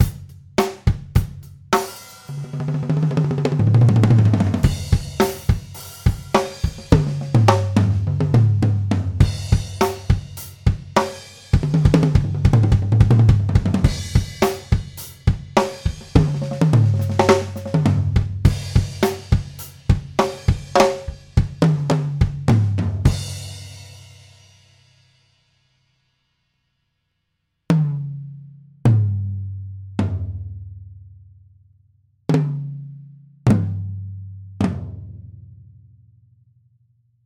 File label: Toms, drum; drum shootout